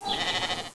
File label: sheep; Close Combat